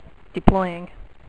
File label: Civilian Deploy; Imperialism